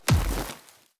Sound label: npc tree golem big projectile